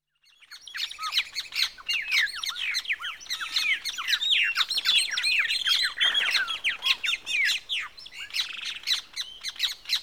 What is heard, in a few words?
Evening Birdsong, Pantanal Swamp; Rural South America; The BBC Sound Effects Library